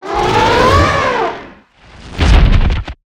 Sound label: Npc Mammoth die; FlyForInsanity